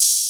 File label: Open Hat, Hip Hop; Drum One Shots; Lowkey